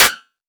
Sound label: SNARE sus